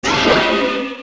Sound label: King Dodongo Hit